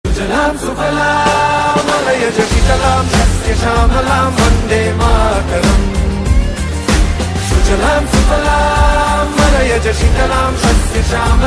bgm ringtone; vande mataram; hrithik roshan; fighter movie

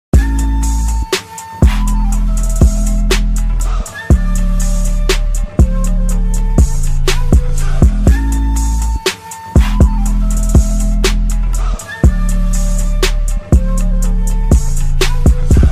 naruto theme music

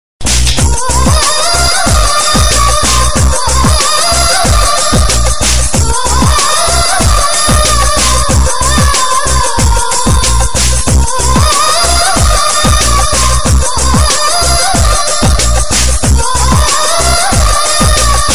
song bgm

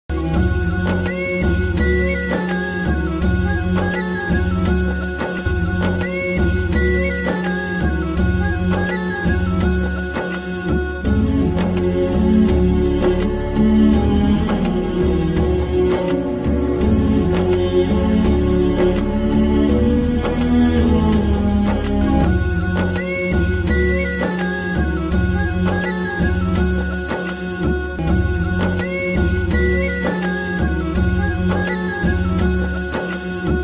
emotional bgm ringtone; govindudu andarivadele; ram charan

